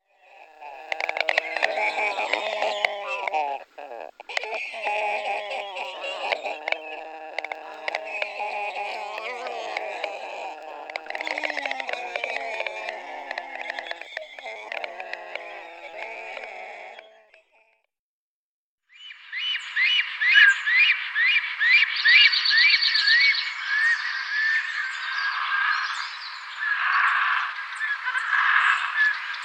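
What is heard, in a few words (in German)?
Schwarzstorch, black stork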